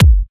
Bassdrums; Vengeance Samples

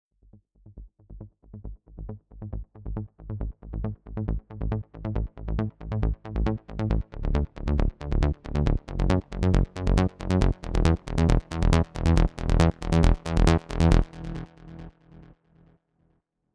137BPM Bassline Intro, Amajor; Unlooped

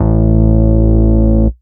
Bass Root A

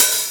Open Hihats, Cymbals; Vengeance Samples

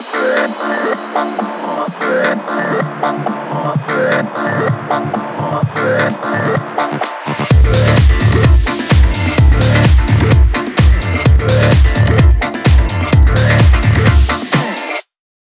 128BPM Mix; Vengeance Samples; VFH1 128BPM Basique Ale Kit

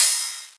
Cymbals Ride; Vengeance Samples

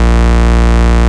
Vengeance Ultimate Bass A# Root A#; Vengeance Samples

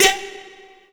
Vocal Oneshots; Vengeance Samples; Vocals

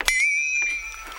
prc, percussion mix; house; PS-28 Vocal House Samples; Drum & Perc

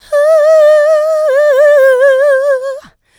vocal house adlibs; PS-28 Vocal House Samples

